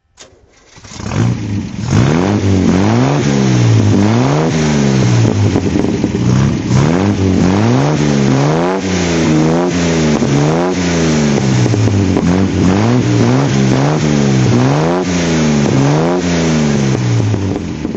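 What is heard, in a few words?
healey3000 mkII throttle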